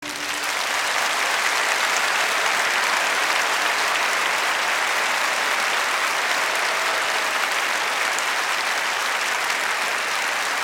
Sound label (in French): Applaudissements